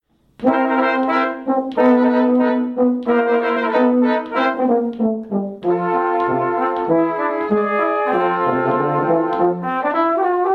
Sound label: Dixie for Brass 4tett